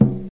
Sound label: smooth tome drum sample c key, Royality free tom single hit tuned to the C note.